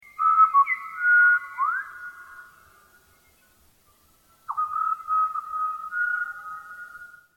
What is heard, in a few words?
Pied butcherbird